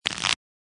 Playing Cards Shuffle; Card Sound Effects

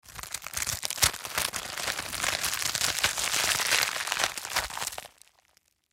Horror Rigor Mortis Flesh Crunch Rip Tear Slow; Scary Soundscape; Blastwave

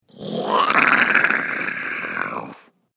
Zombie Gurgle Growl; Zomby Sound Effects; horror

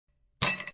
Ice Cb Thrw One, Ice cube thrown in glass.; Drink Mixing (Sound Effects:Bars)

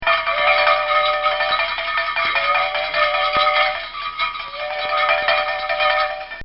Bells And Whistles

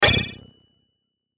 burst twinkle; Buttons; Multimedia

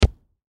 Football Kick; Football (Sound Effects:Sports); Blastwave

